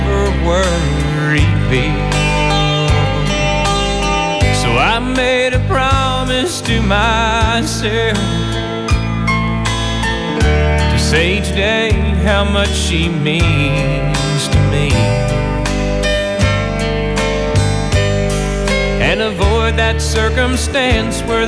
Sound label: country